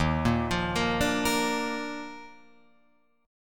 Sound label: Eb Major 7th Suspended 2nd Eb Guitar Open F; arpeggiated; EbM7sus2